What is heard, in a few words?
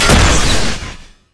grenade; rumble skin03